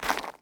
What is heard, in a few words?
zombie footstep; DeadFright